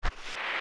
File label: Steps Push Crawl Grass; zelda; OOT